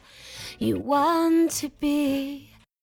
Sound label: vocal